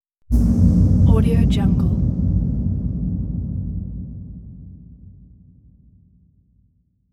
distant impact with airy tail; hollywoodedge

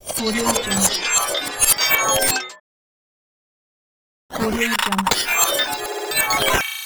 data glitch

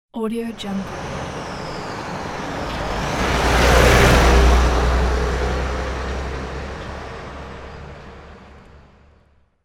large dump truck passing

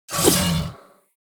weapons sickle slash

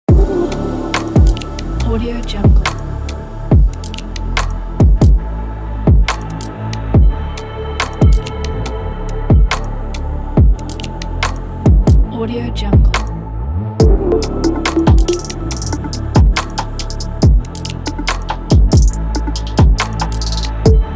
abstract dark beat

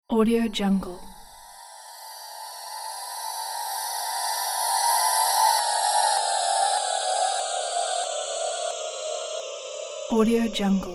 horror magic atmospheric

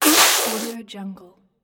liquid spit out

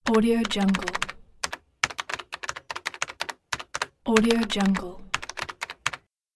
the typing

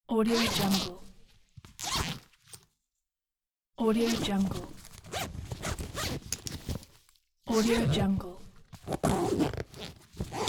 zipper

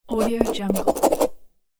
pen writing signature; floraphonic

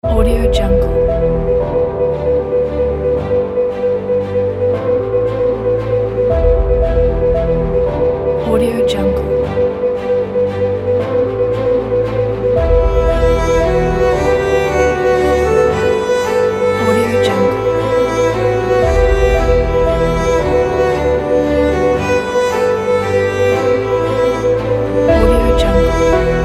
historical

